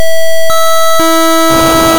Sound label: samples sn76489